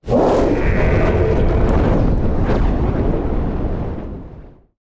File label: breath attack small fire, dragons; creature; psfx